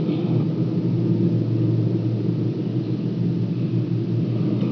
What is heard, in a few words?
under, ambient tones